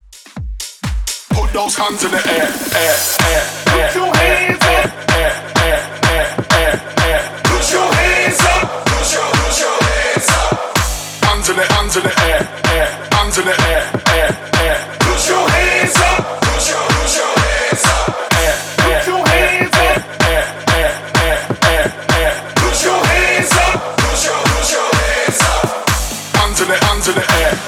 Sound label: ReDrum Dance Party Hype Intro 127 BPM